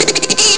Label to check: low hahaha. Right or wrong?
Right